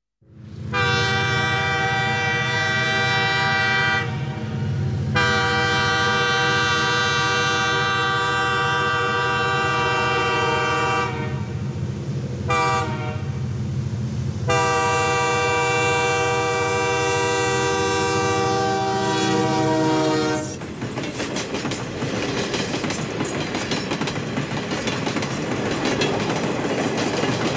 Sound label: Diesel Train Passing; THE SANTA FE SERIES; American Southwest